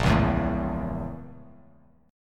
Bb Minor Major 7th Flat 5th, Bass Standard chord; BbmM7b5; strummed; 6,4,2,2; JGuitar

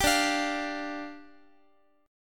D Minor Double Flat 5th, down, Guitar

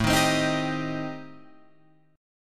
A-Minor 7th Flat 5th, Guitar Open F, chord; strummed; JGuitar

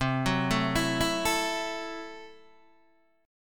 C 6th Flat 5th, C, down, Guitar, DADGAD

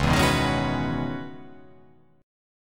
C sharp Minor 11th C sharp

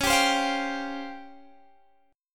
C sharp Major 7th Flat 5th Guitar Open G

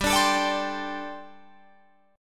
G Suspended 2nd Suspended 4th G, Guitar, Drop D; chordshape; down

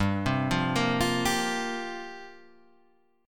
F Suspended 2nd Suspended 4th G

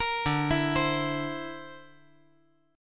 D 7th Suspended 2nd Sharp 5th D

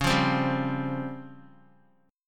C sharp Major 7th Flat 5th C sharp, Guitar; strummed; chord; x,4,5,5,7,x

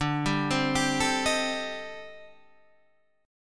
A-Minor 7th Flat 5th D, chord; Am7b5/D arpeggiated; JGuitar

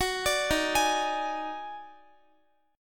Eb Minor Major 11th, down, Guitar; EbmM11; arpeggiated; JGuitar

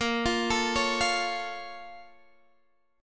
A sharp Minor Major 7th; x,13,15,14,14,13; arpeggiated

